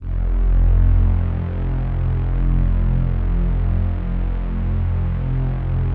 LUXURY F2, LUXURY PAD; Drums In Your FACE!

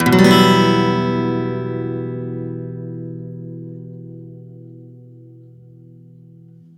E min downstroke, Acoustic Guitar; Sonic Foundry (Sony Creative Software); Songwriter Acoustic Guitar Companion; One Shot Chords

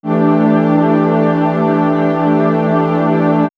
Swirls String Chord, pads; X-Static Goldmine

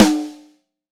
MAPLE SNARE

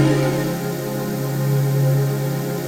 TRANCPAD; Best Service; XX Large Pads; Sample CDs

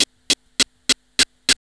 HH LP, Hip Hop R n B; USB Soundscan vol.19; AKAI

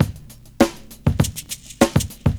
70s Breakbeats; USB Soundscan vol.46; AKAI

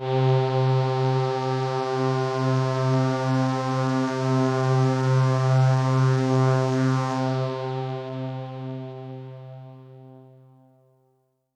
Pad C, Synth Prophet One Shots; Electronisounds

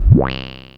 Synth (reverse filter low); Sonic Foundry (Sony Creative Software); Ugly Remnants VOL-2

